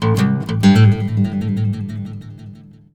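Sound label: baritone lick A, Blues Guitar; ILIO; Fretworks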